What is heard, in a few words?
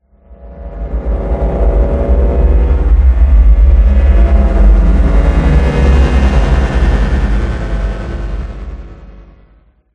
Designed Circuits Hum tonal pressure; gregor quendel